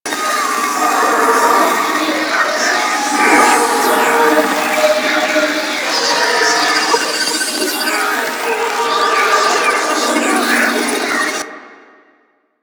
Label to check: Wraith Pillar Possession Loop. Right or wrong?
Right